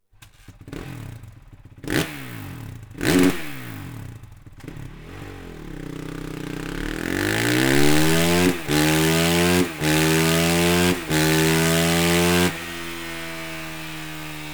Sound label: crf450r stock